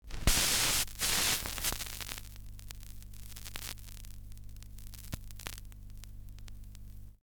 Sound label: Vinyl Needle drop